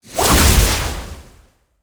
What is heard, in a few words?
BOSS Atk; Fabao